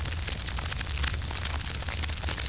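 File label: FLAME LOOP; ABUSE2; PC Gamer 51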